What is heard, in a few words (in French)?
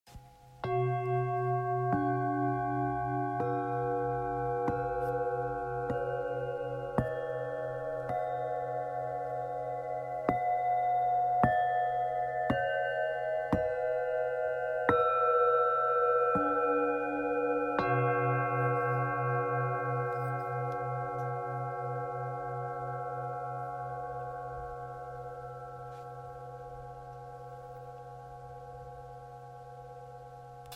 bols bengale voyage sonore